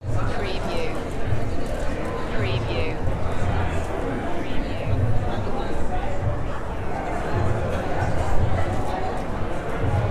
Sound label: Crwd Exhhbt, throng